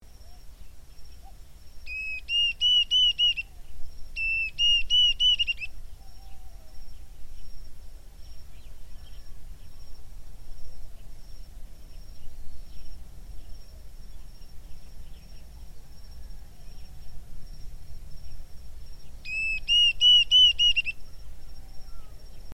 cuckoo

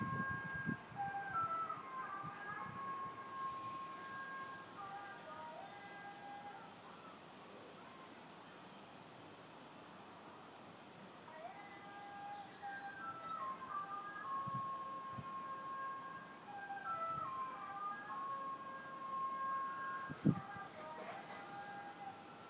garden sounds

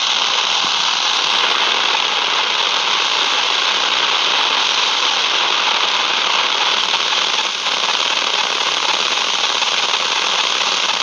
found walkman radio tuning; for london drift

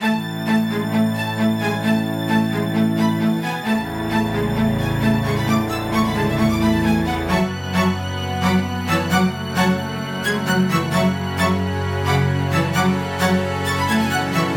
strings without beats

